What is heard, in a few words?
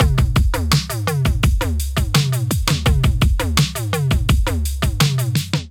Western Australia Kit groove 84 bpm; MIDI Clips; Drive and Glow; Ableton; Factory Packs